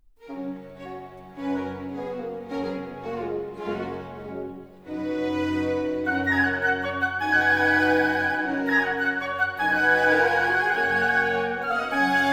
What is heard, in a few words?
Musette - Gracieux; Rameau; Suite from Platee